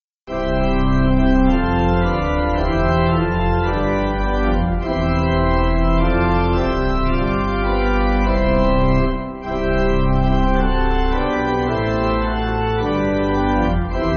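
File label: Pipe, Pipe Organ, Music Backing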